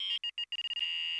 key success, computer; cardassian

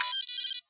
keypress, computer; sona

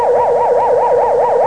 sensor display, technology federation

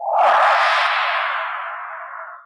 holo on, technology federation

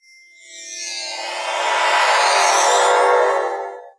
beam, technology federation

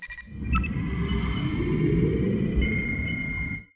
stellar zoom in, technology federation

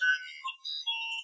input ok, computer; cardassian

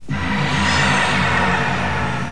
warp pass; ferengi technology

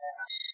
scr display, computer; romulan

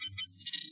scr display, computer; cardassian

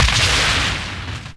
hull break; federation

